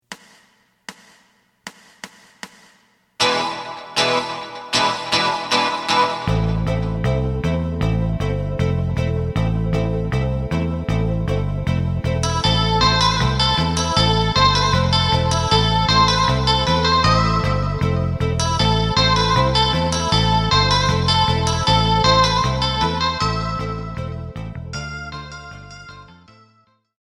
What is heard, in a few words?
drum